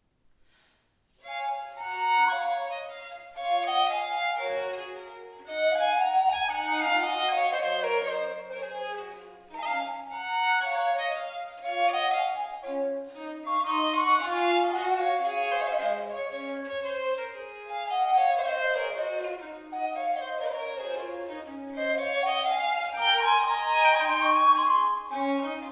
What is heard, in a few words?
Air pour l'Amour, Ensemble für Alte Musik; Rebel